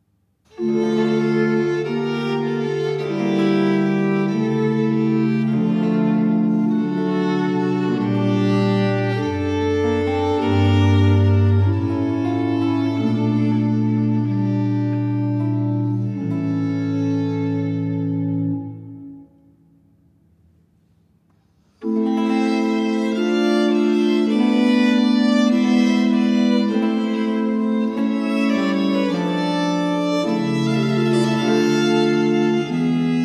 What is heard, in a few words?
Sonate 2 in E minor - 1. Grave; Archangelo Corelli; Arcangelo Corelli; Corelli - Complete Works; Klassika